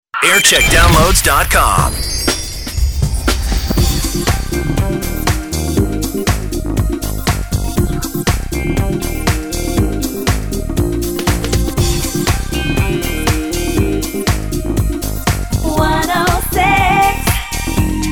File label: jingle montage; century fm